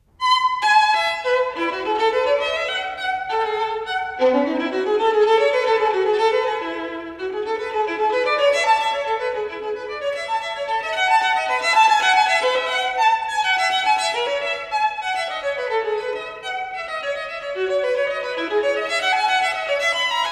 Partita in E major - 6. Gigue, Solo Violin; Partita no.3; BWV 1006

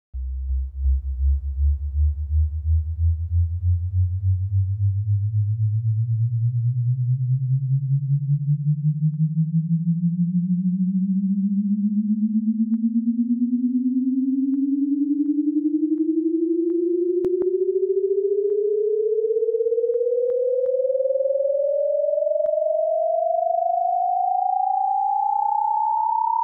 Demagnetizing Sweep; XLO - Test & Burn in CD (Gold); XLO Reference Recordings

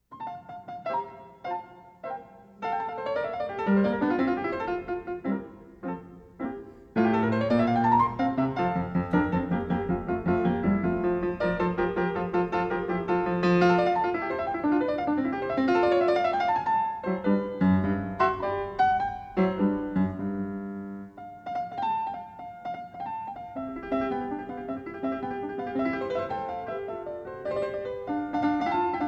Piano Sonata In F, Presto; Mozart; Piano Sonata No2; K 280; 3. Presto

